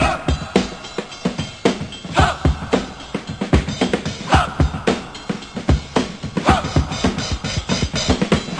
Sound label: say "huh" lofi kit, 111 bpm